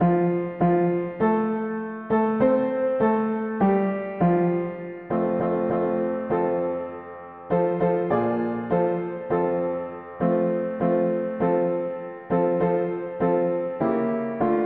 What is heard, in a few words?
mono piano; hymns